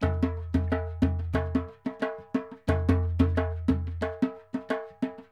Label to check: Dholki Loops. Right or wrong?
Right